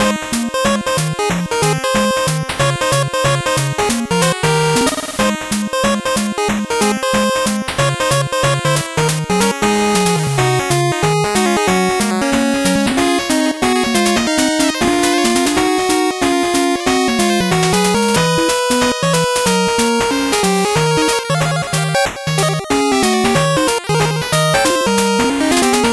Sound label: 8bit bgm fast